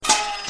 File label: blasted refill heavy; billy ed edd n eddy sfx